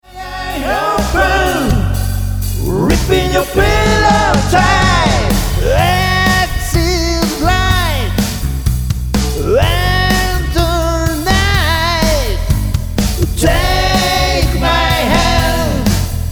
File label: Guitar